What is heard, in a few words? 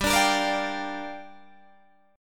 G Minor 7th, Guitar, DADGAD